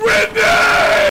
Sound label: Grenade